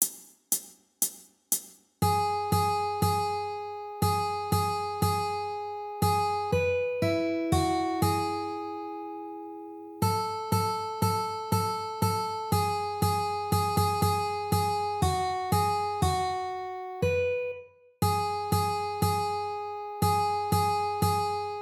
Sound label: Jingle Bells Easy 120bpm